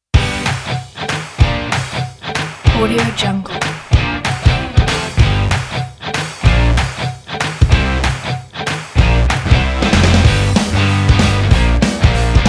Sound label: indie rock upbeat garage